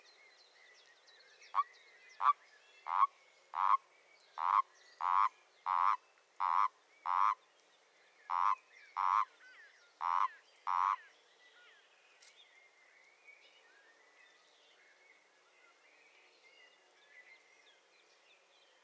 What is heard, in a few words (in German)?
Erdkröte; Amphibien; Froschlurche; Stimmen; Oliver Swift